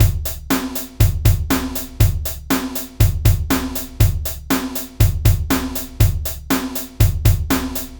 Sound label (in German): grundbeat